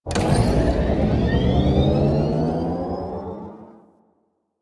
Acceleration, Vehicles; Warframe; DE; RailJack; RailJackShip; AlternateEngines; Zekti

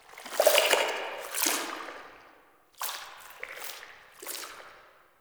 Cave Sap Gush, Ambience; One Offs; Neathuns Root Cave

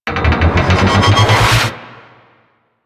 Stomp Woosh; Enemies; Abilities; Warframe; Teralyst

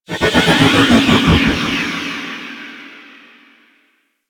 Hub Elevator Lift Stop; Tenno; Warframe; Ambience

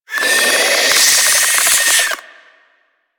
Infested Face Hugger Charge; Warframe

